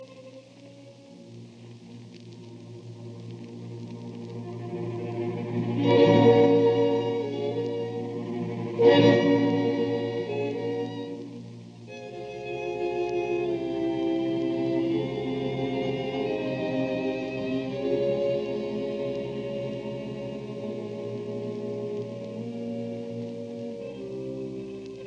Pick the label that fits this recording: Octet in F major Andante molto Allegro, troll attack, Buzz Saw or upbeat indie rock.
Octet in F major Andante molto Allegro